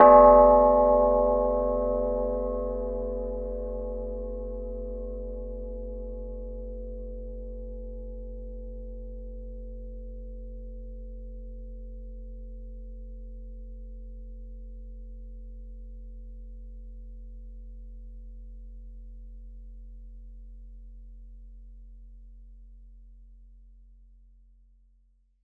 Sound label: Gamelan Like Bell